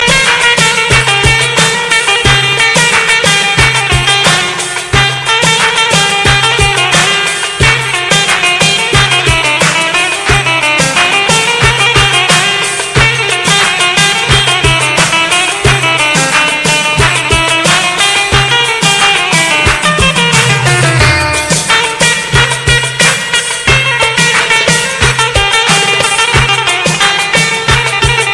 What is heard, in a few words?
ciftetelli